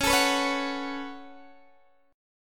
C sharp 7th Suspended 4th Sharp 5th C sharp, Guitar Open D